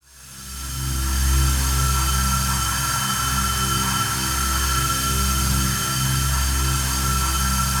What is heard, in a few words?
ATM TONAL; Best Service - XXL Production Effects